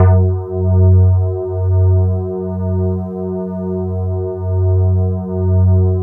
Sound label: E-HARP, DX HARP; Wizoo - Powered DX